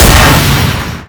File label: giant explodes; giant common; Garry's Mod; tf2; mvm